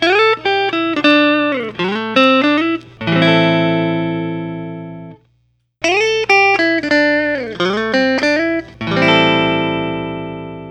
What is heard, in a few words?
Fender Custom Shop 67 Telecaster